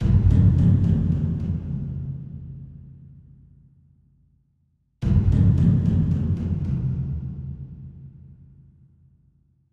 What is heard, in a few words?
Random Music Cuts Swampy